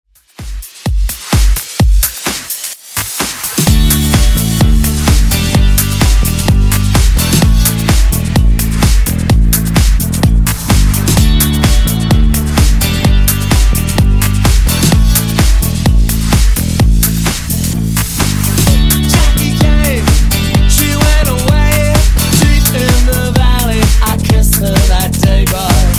Funk Throwback 128Bpm

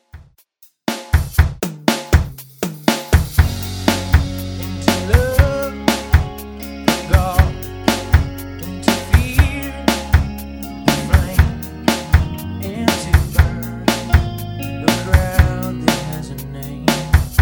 90s Rock Real Drum 120bpm

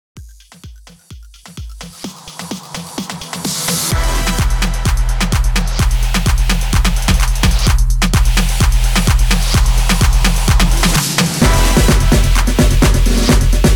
128 BPM

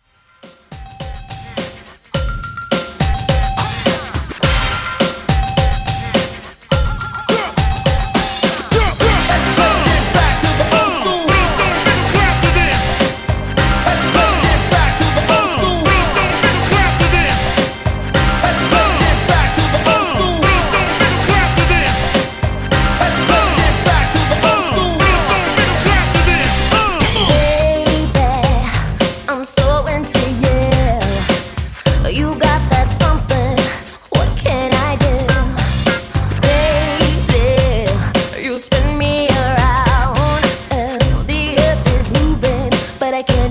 Party Intro 105Bpm

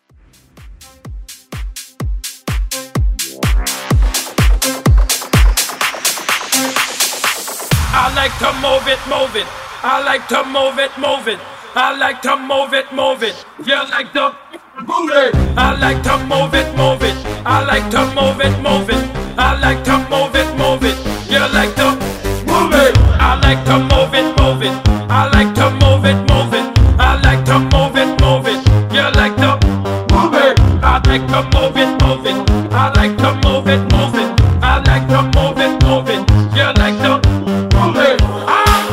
Acapella Drop House Mash Up

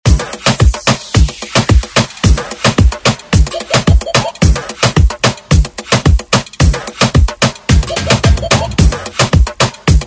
Moombahton Redrum

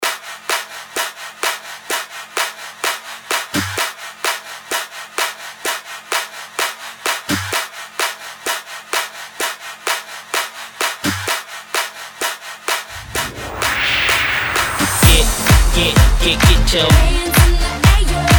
Remix Hype Clean 128 Bpm

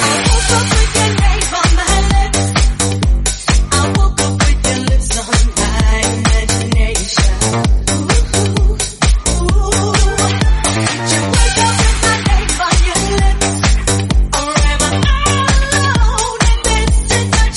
130bpm Gm

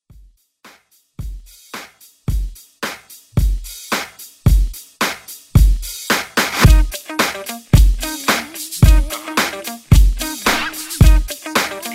Moombah Surprise Edit

